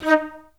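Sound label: flute staccato D#4; Best Service; Peter Siedlaczek's Advanced Orchestra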